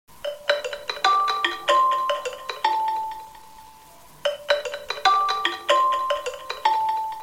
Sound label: xiaomi redmi mi ringtone; mountain spring